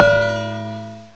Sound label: GAMB GONG, MALLETS; CMI Sound Library Rev 1.3